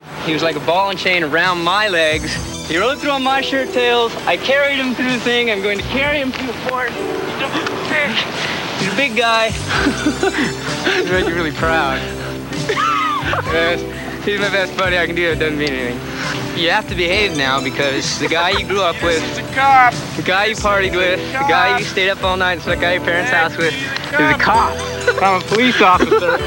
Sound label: Under Cover Synth